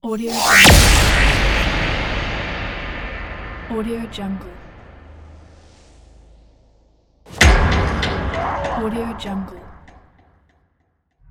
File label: powerful sfx